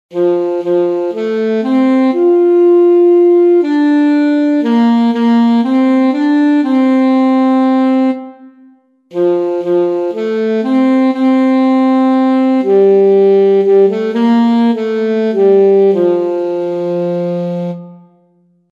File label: alto saxophone; on top of old smoky